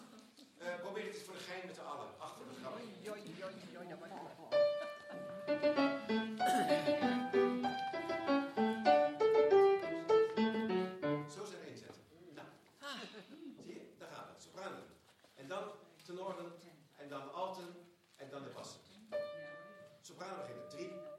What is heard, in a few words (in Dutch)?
Halleluja SATB